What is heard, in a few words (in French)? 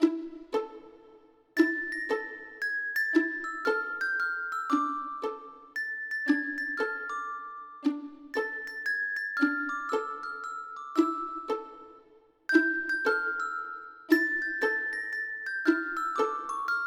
Cloche